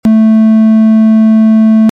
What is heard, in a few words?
triangle